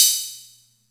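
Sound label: Atlantex Music Percussion Computer hi-hat open; Atlantex MPC-1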